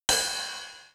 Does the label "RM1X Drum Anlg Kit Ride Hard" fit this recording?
Yes